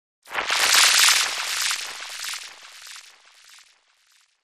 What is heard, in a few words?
Liquid Liquid Ripple with Delay